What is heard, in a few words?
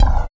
Sweep Kick; Sonic Foundry (Sony Creative Software); Esoterik Beatz; Sweep Kit